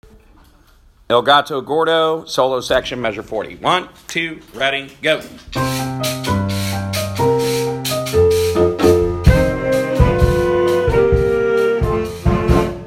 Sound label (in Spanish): el gato gordo with sax solo